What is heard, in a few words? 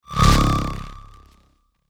Aggressive, transition